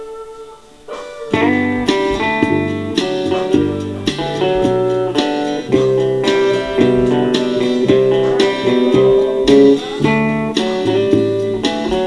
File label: ride